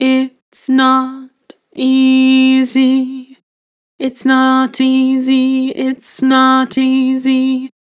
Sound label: Vibrato Its not easy, Harmor Vocals